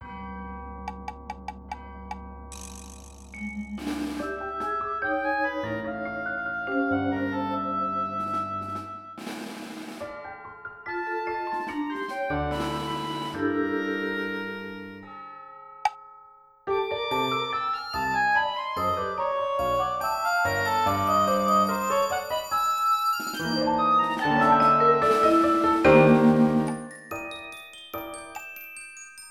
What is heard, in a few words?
Oboe Percussion Piano